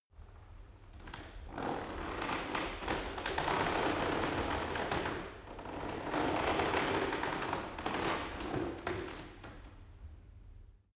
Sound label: cart medieval creak; kutsche; verkehr transport